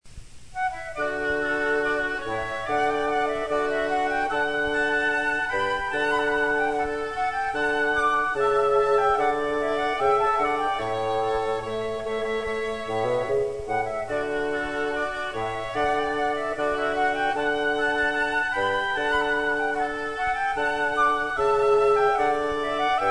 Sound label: Bassoon Trio